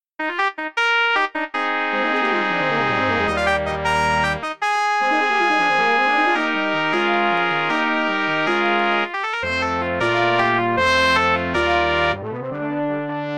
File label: fanfare, brass ensemble